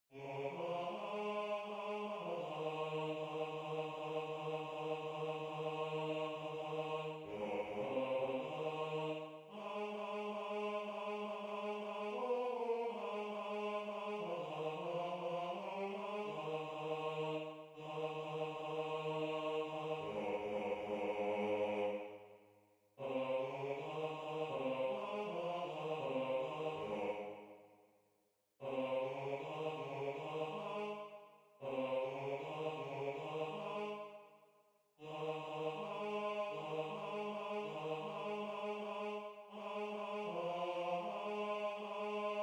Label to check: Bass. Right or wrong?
Right